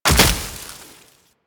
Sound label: Land Heavy Veg, Footsteps; Warframe; Tenno